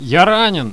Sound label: alpha wounded; svencoop; paranoia